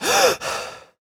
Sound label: voc gasp male; oblivion; Realistic Fatigue